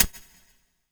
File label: DRM HAT Semi-Dark; Polyend; Plughugger